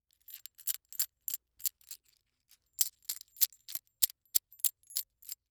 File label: Coins Multiple Coins Jingle In Hand; Foley